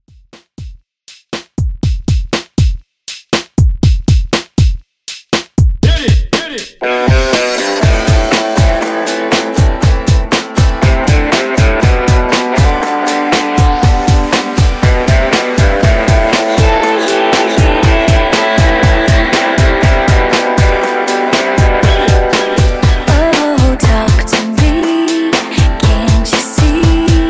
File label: Redrum 120Bpm; djyan